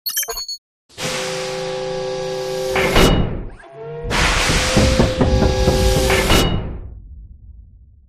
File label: vault change